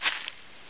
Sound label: grass; XDM